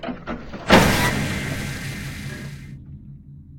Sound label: Door Error; scpcb-godot